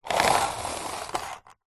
table tennis portable retractable net retract close plastic housing